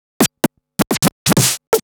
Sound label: Scratch Fills 140BPM